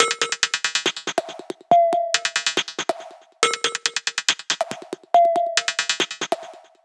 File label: Bpm140 Perc Loop, Dubstep; Perc Loops